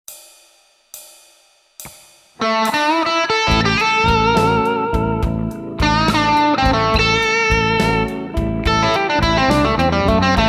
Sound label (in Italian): solo, Corso chitarra blues